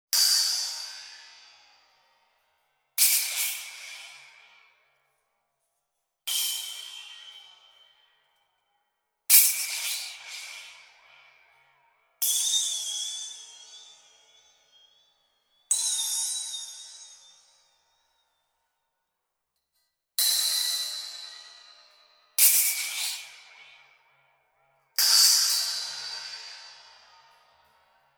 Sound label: Creased Bands